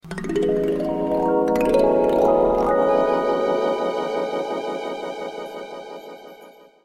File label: 6 Notes Harp Sms